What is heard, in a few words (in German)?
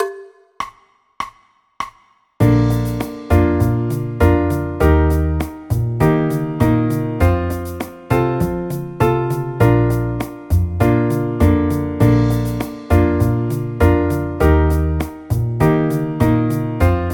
bb dur akkordverbindungen